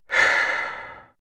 sigh

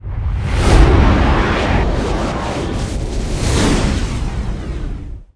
warp end; ships; movement

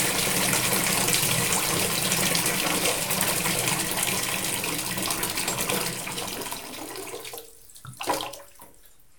bath; house

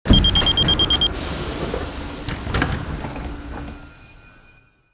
doors open, trains; general trains

